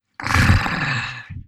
monster growl; emoji-tablet

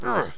pain; sarge

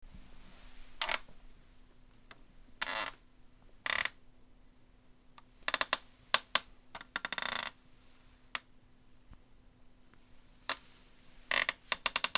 creaky wooden stool

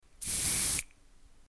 deodorant spray short

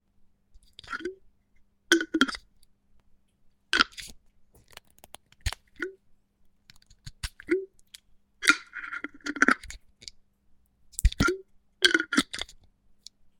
ketchup bottle opening closing

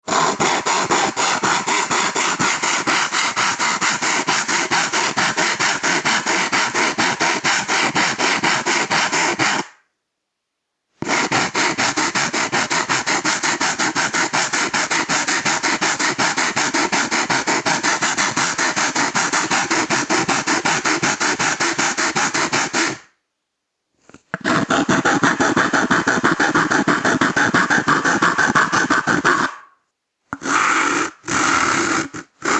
toilet paper roll saw

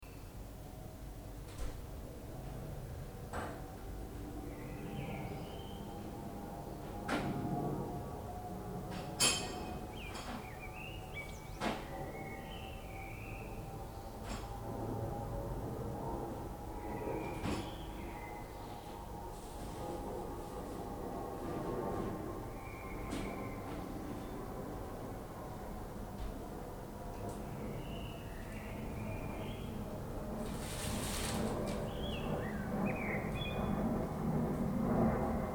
plane birds and kitchen

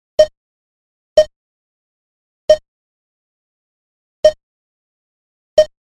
supermarket price scan

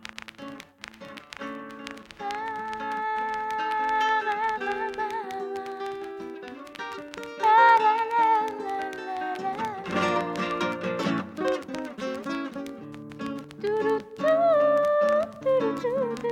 Bossa; Sebkha chott et le monde itinerant d ohreland

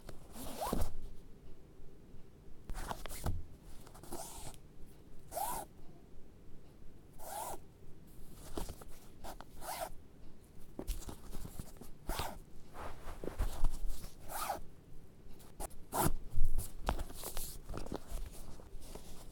cord rope clothing shoes pack

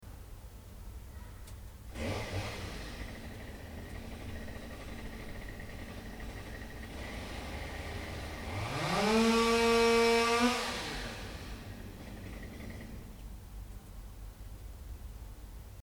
chainsaw

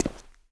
boot, footsteps

